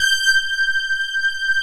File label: SYNTH SOFTS; Pro-Rec - Synthsonic